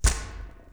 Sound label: knock